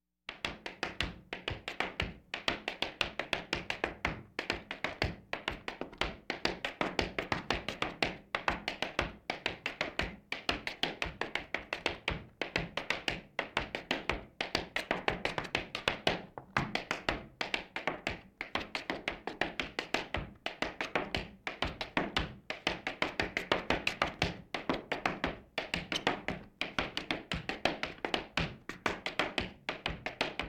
Laugh tracks

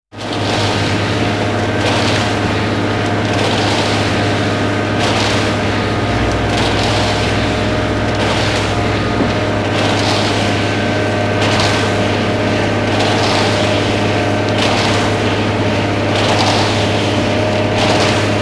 Cement Mixer Truck; KMP Film Video Sound Effects

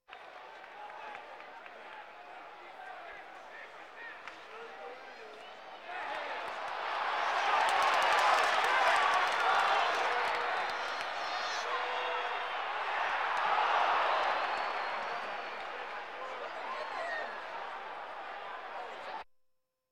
Sports crowds

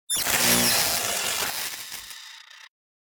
Electric Arc Short Circuit Electric Shock

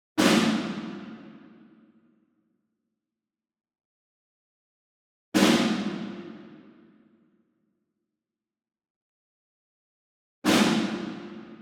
ENSEMBLE SNARE Group Flam Far; boom library; Cinematic Strikes Construction Kit

